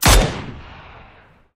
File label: Gun Sound; Gangstar; Gully Loops